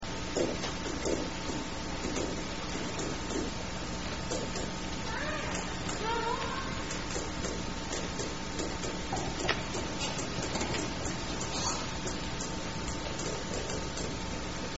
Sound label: double clicks, transient killer whales; salish sea